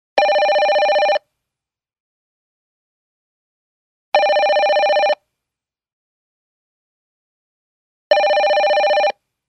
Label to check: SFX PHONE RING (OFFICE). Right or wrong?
Right